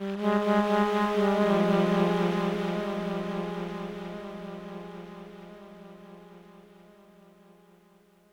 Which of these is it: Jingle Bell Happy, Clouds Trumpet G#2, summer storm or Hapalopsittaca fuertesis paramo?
Clouds Trumpet G#2